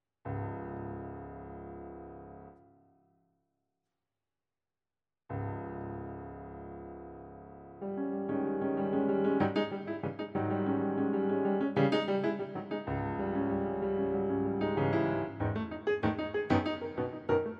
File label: Synchopatic Piano Solo